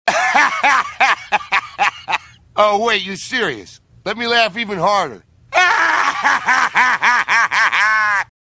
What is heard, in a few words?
laugh harder